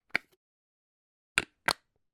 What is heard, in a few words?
bottle golden syrup cap open then close